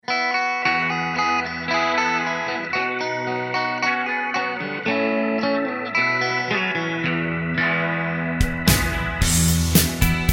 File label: (E) No Backing Vocals